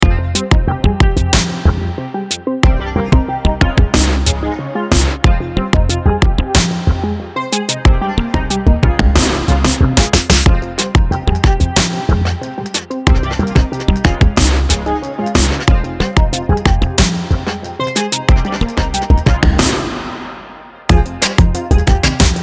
Electronic Shy Beat Loop

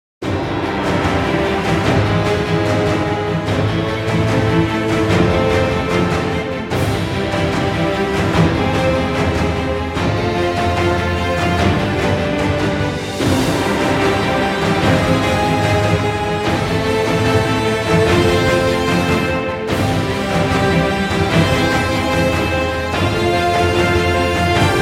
Dramatic Blockbuster Epic Music

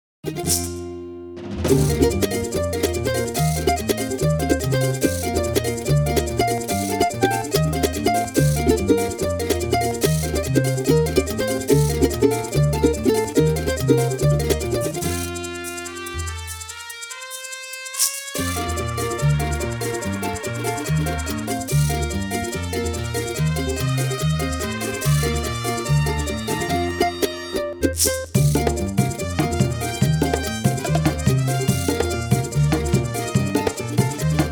Acoustic & Fun Ukulele

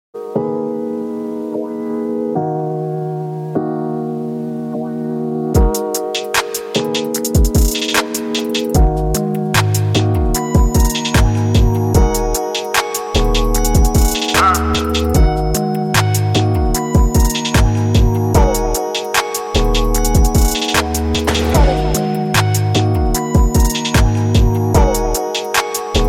hip-hop

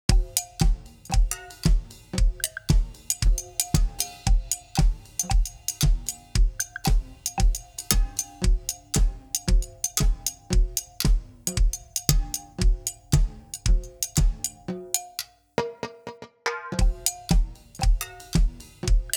braz rhythm

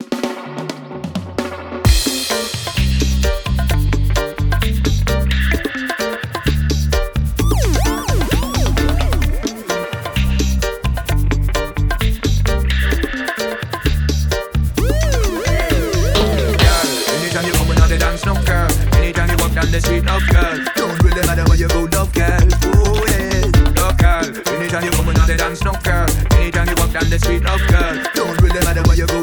Reggae HipHop Beat; Sitdown, Please

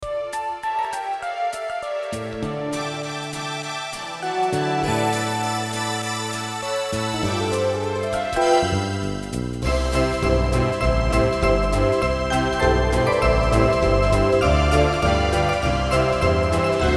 Electro Bells

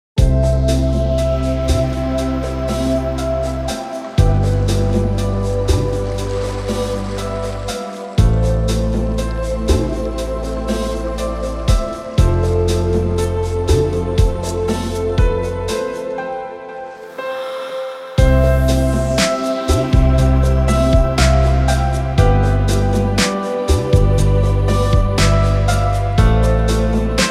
Chillout

